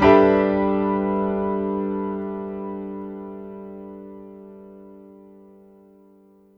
piano stack (G) chord; Sonic Foundry (Sony Creative Software); Ugly Remnants VOL-2

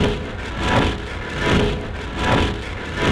Grind Env Rev; Sonic Foundry (Sony Creative Software); Hydroponic Hip-Hop

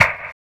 PERC; Spectrasonics; Metamorphosis; 3RD MAN

